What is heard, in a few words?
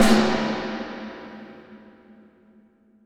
Drums Acoustic, SD; USB Soundscan vol.10; AKAI; 90s sample CD; ALL SD